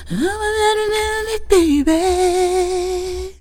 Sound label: FEM, Hip Hop; E-MU Formula 4000 Series Vol. 1; Hip Hop Nation; Phatt Phemmes 2